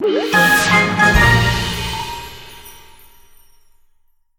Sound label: Get Item (Relic); A Hat in Time: The Complete Gamerip (gamerip) (2018)